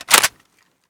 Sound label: clip in, weapons; garrysmod